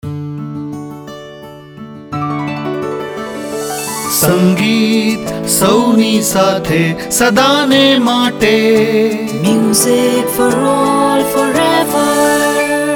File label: music stereo